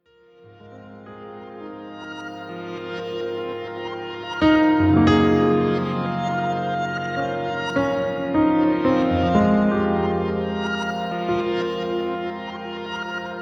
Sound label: jazz